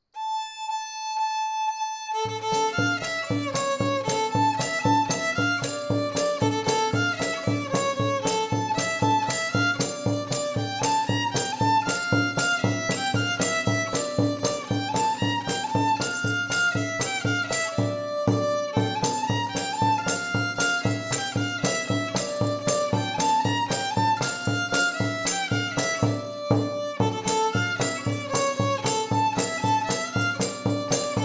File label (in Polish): polka zydowska kapela; zydowska kapela malkow